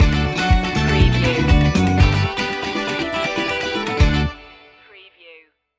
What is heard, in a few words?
stinger